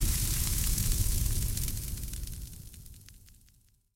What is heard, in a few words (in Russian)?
plamya po doroge svist, zvuki kokteylya molotova